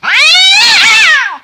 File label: cat, halloween; Source Engine; tf